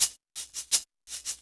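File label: drum and bass Shakerloop; Dance DJ; Percussion; Shakers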